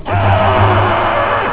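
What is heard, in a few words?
pain scream; halloween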